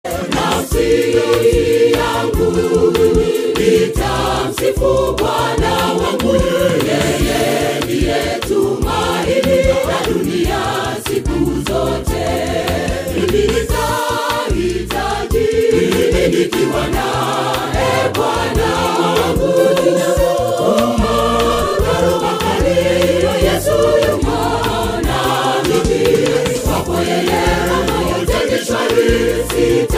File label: Choir; Kurasini SDA Choir